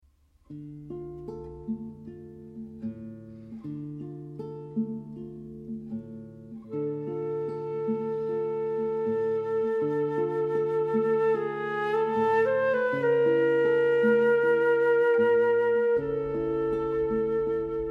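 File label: suite II, flute, guitar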